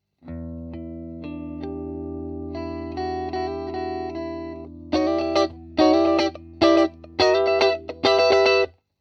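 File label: clean bass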